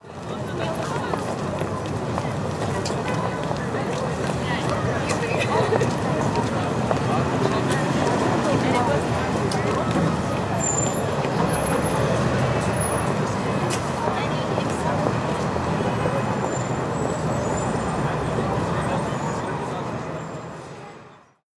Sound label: Christ the Redeemer City